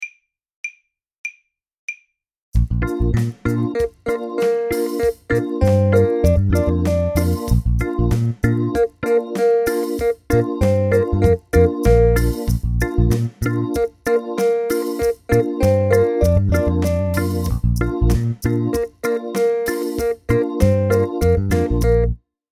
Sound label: saxophone; mib